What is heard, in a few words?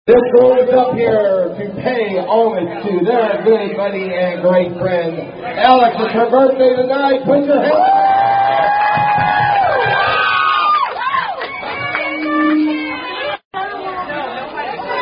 Happy Birthday Traditional Copyrighted Tune